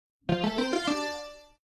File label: level up